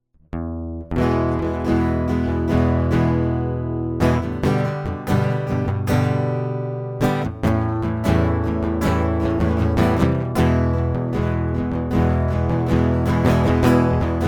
acoustic

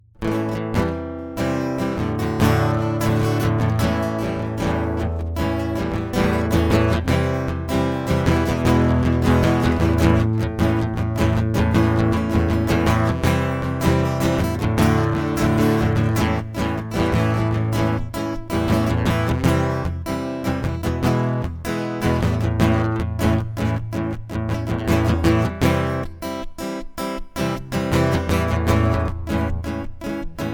acoustic

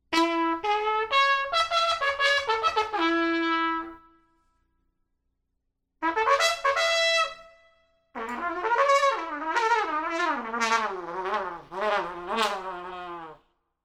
Trumpet; The King is Dead

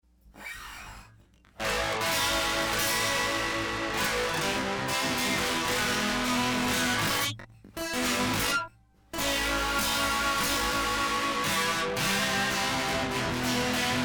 guitar; I'm Leaving

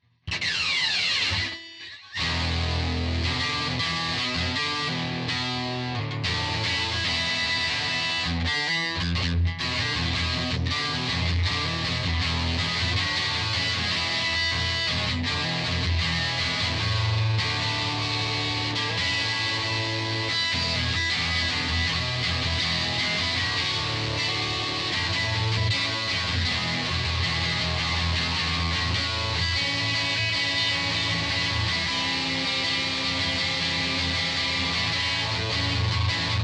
electric

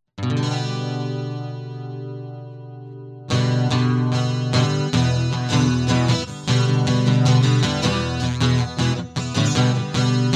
Body Moves Guitar

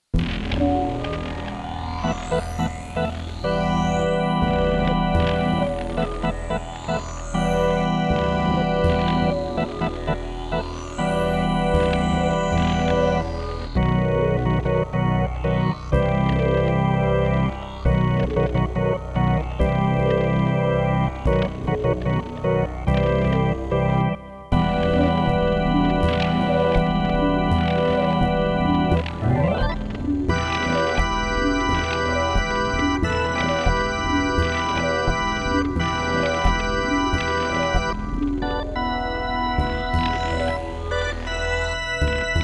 With It Hammond B3

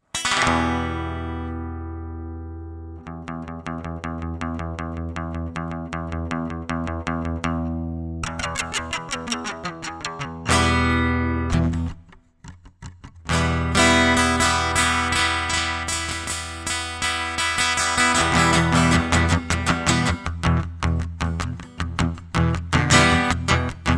acoustic

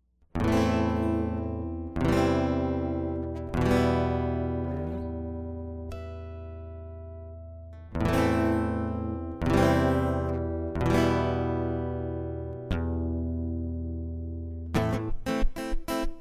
guitar prelude; MegaEpix Enormous

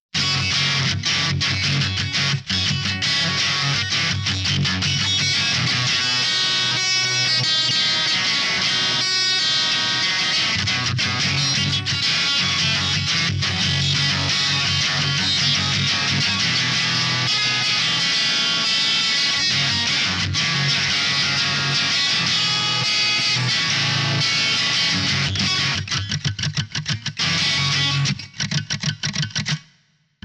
electric

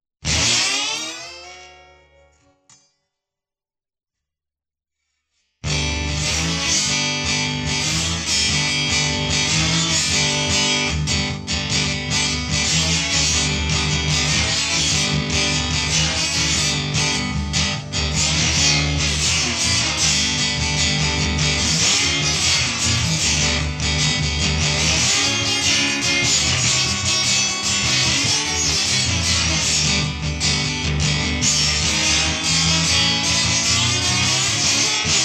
Dance Slide Guitar Loop

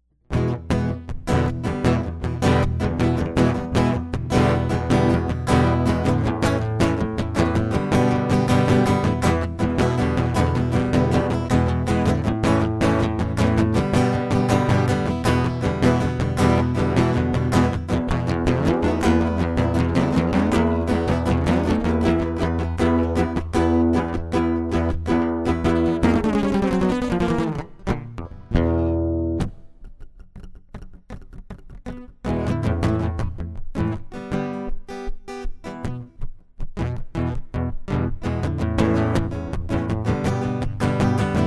acoustic

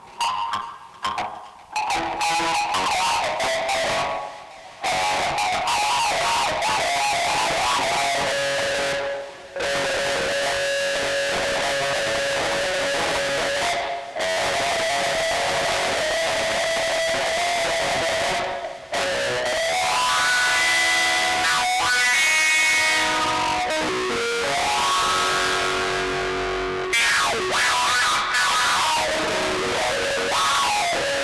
electric

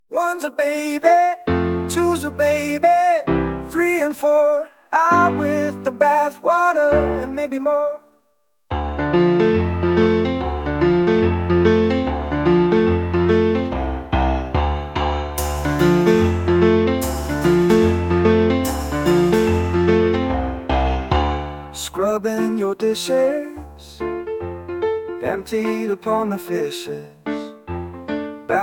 Unplugged

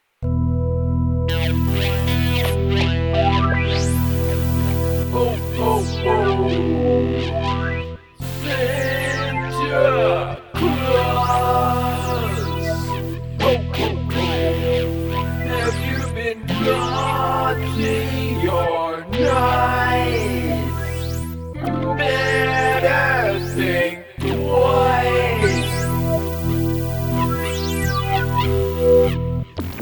electric intro

